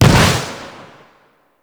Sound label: Arcane Melee Shockwave Sphere Explosion; Warframe; Mods; Proc